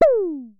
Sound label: Synare Filter; Star Instruments Synare 3